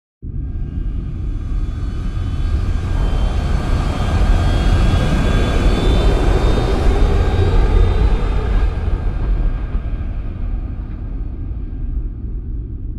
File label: Cargo spaceship pass by